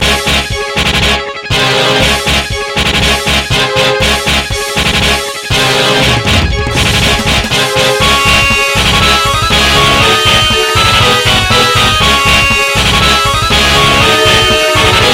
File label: Metal Remix